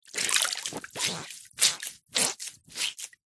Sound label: cleaning cloth soaking wet squeeze squelch; household